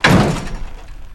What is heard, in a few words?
Jeep Crash Jeep, car crashes